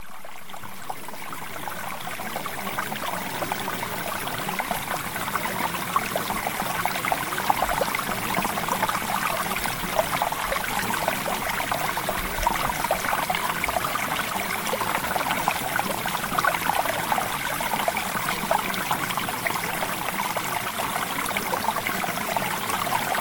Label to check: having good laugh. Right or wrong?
Wrong